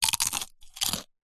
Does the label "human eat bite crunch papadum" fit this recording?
Yes